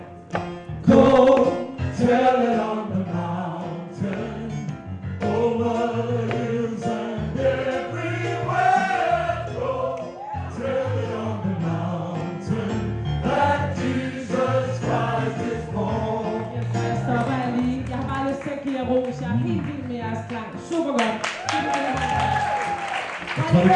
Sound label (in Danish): tenor, kor; go tell it